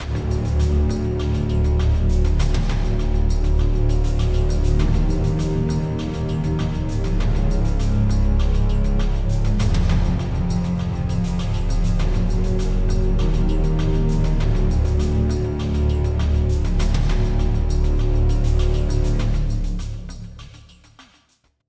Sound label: Space Bass